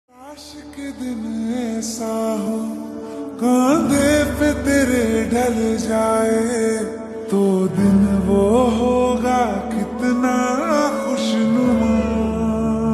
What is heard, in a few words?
Slowed Reverb Ringtone